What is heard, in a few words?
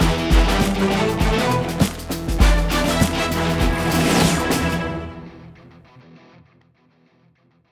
Enemy Cube Destroyed; Block N Load